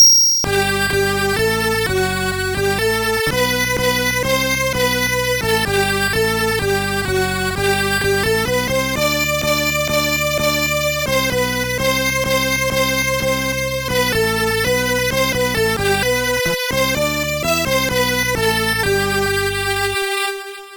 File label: God Save the Queen, Music Box